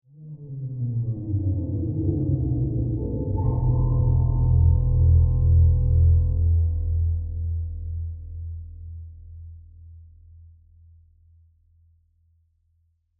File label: Extrem Scary And Diffuse String Transition; Scary Soundscape